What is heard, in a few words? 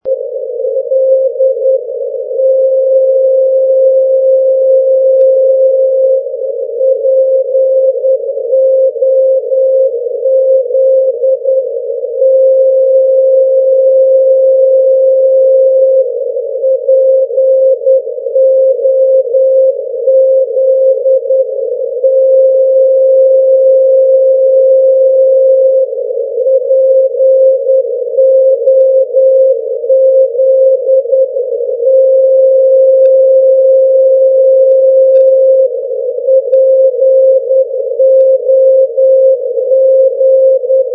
ndb pozarevac; europe